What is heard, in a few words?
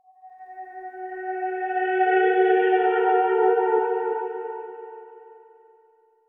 Violin Ghost